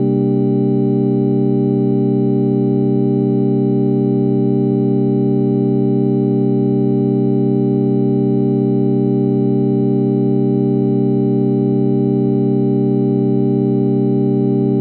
Retro Synth Single Note

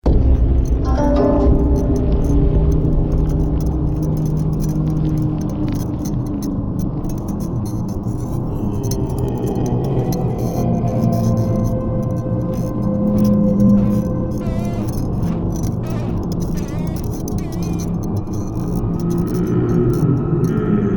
Horror Menu Ambience

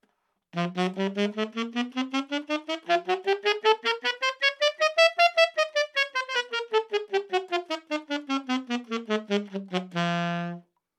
Alto sax fast scales

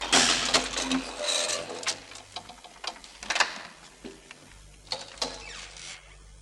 Lift Doors

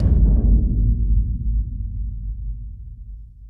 Ultrasound Boom

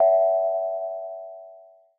Phone tone bell

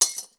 Glass

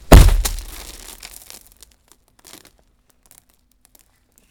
Hit With Dirt Spray Body

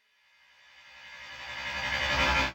Hyperspace

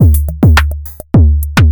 Rhythm maker Randomized 140 Bpm Loop

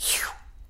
Woosh

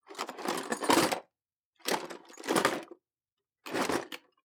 Tool Box Rustling

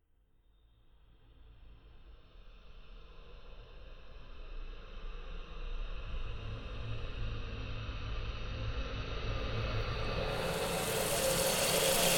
Horror Build Up; Imaginary Friend

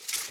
Arrow Flying

